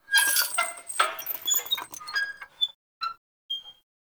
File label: Path Lantern Impact Swing, Hanging Lanterns; Dendrit